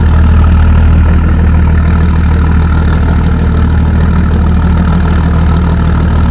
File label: Vehicle Engines; Red Orchestra 41-45